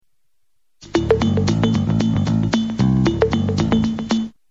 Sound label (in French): jingle pub; Publicité, ouverture; jp goude; c'était LA CINQ